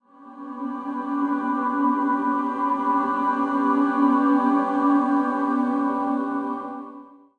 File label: Reward pad; roguebook